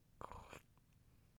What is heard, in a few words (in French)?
ouverture bocal; Fourniture